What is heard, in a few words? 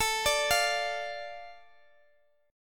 A Suspended 4th Sharp 5th A Guitar Standard, chord; Asus4#5; arpeggiated; x,x,19,19,18,x; JGuitar